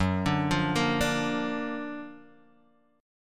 F sharp Augmented Major 7th F sharp Guitar Half Step Down